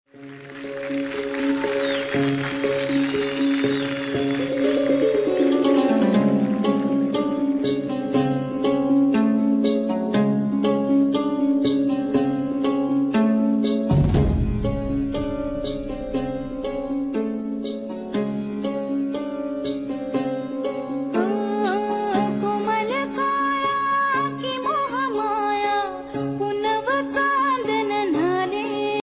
marathi ringtone

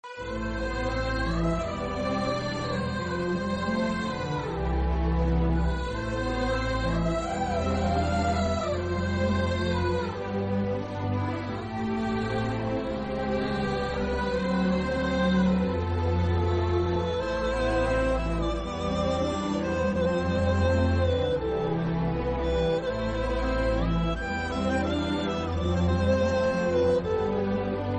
sad ringtone; teenmaar movie

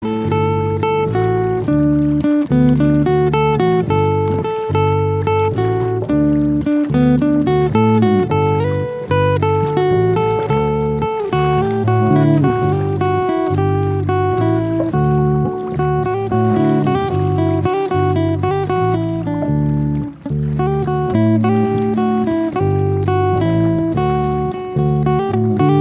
new guitar ringtone

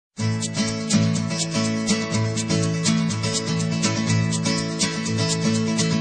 mexican guitar

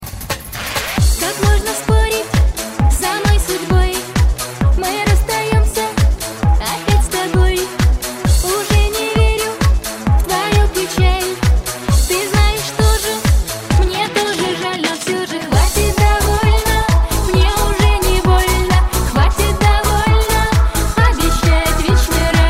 russian mix

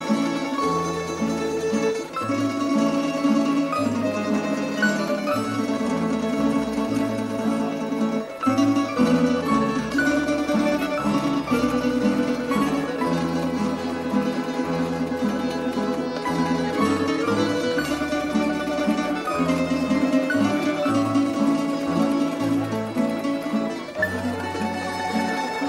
instrumental croatian ringtone

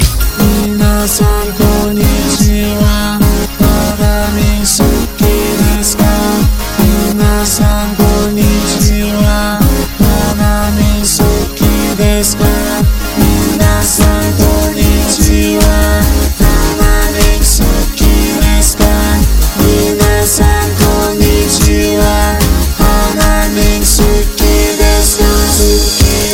cool japanese techno

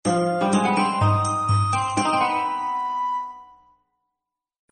sms turkish

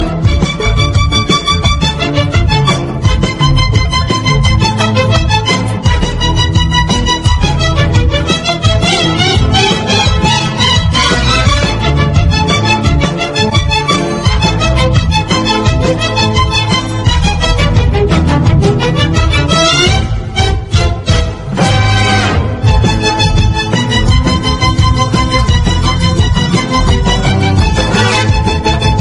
egyptian violin; ringtone

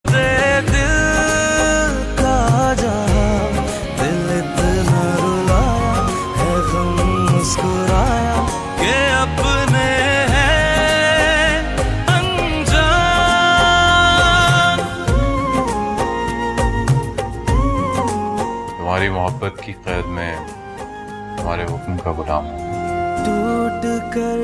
bengali ringtone

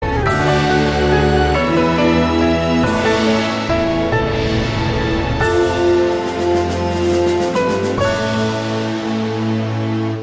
nokia ringtone

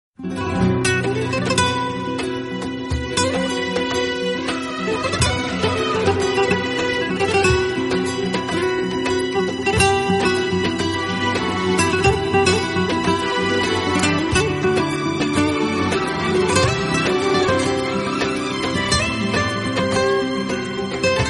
turkish guitar, ringtone

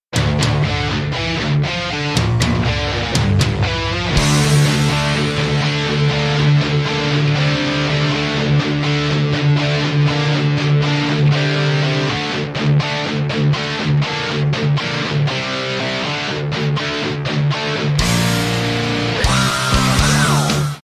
rock ringtone

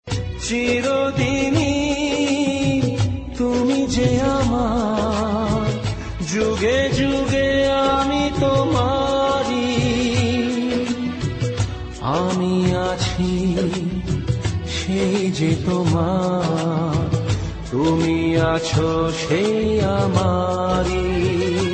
Bengali hit song ringtone

